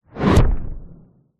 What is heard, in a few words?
magic spell whoosh ball of energy imcoming hit thud; fantasy